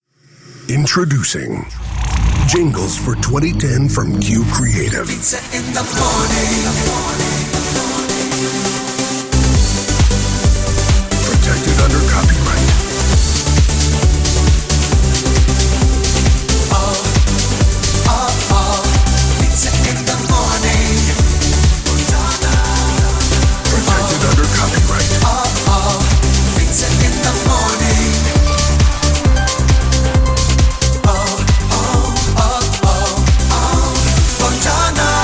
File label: Jingle; WarpbyCue; Fizz in the Morning